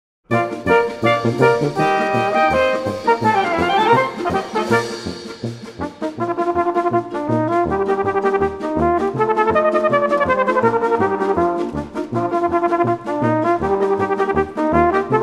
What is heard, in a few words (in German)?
Fluegelhornzauber